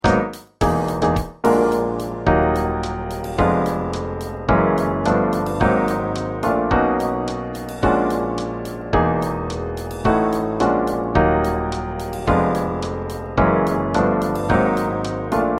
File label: Piano Drum